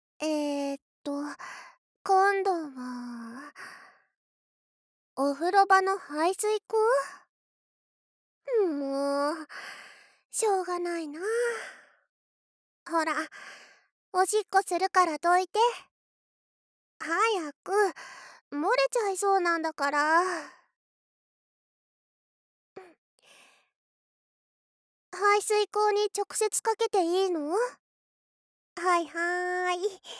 排水溝におしっこ

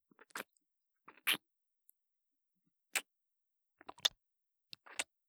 ちゅ、ちゅ, 効果音

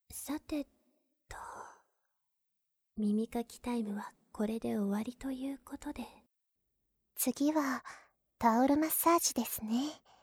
オイルマッサージ

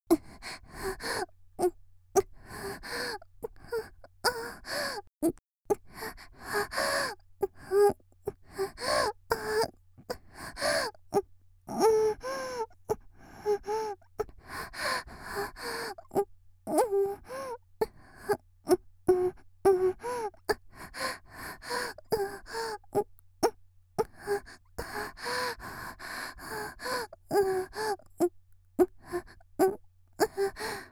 「喘ぎ」声を潜める30秒; 綾波系; 少女

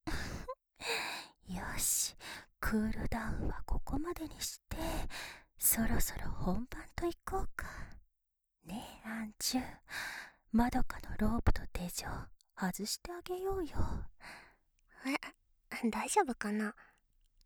両耳を 耳舐めでたっぷり愛撫, ASMR; マドカの両耳を、アンジュとルミナが耳舐めでたっぷり愛撫

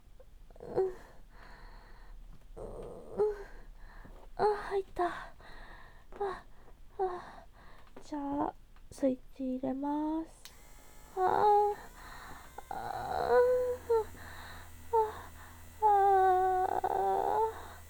喘ぎ（実演オナニー）; 一発やる会

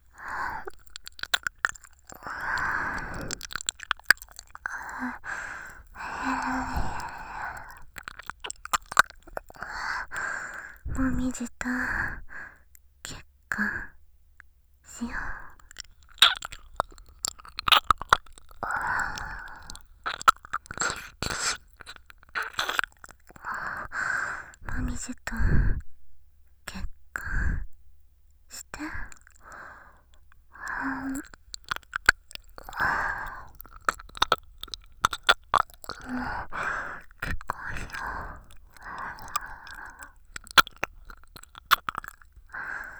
耳舐め

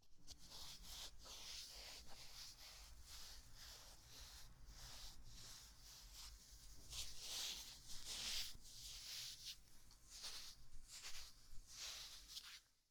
タオル拭き; 音フェチさんのための効果音抜粋 vol.1

